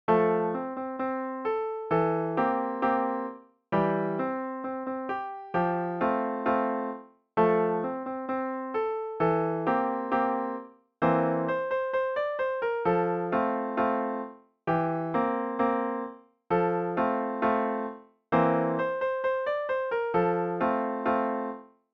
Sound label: piano solo